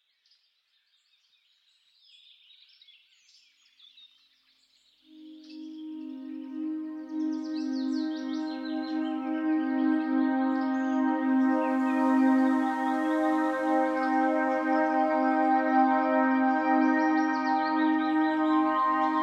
Journey Through Nature - Atmospheric Ambient Mix; Mixes and Soundscapes

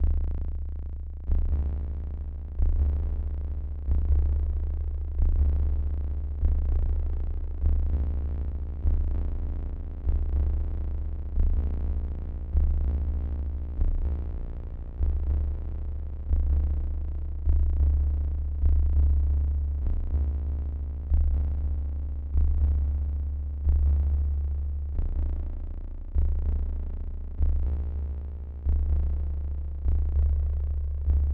noise, dark ambient

